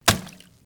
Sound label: Wet Splat